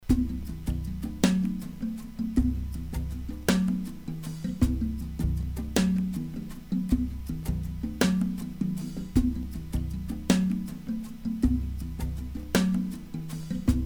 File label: Rhythm Groove